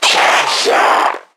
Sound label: NPC Creatures Vocalisations Infected